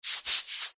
human bare feet skin rub together

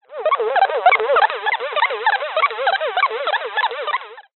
science fiction radio signal interference burst

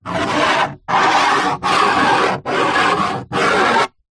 foley plastic smooth hollow sharp object scratch; zapsplat

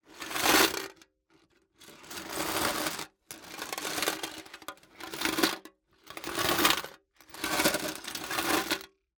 Coins Movement Tin

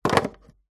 household telephone landline receiver hang up

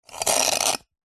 nature ice block sharp knife scrape

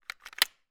gun carl gustav m45 swedish k; still north media

